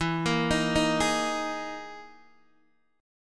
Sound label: E Major 7th Flat 5th E down Guitar Open D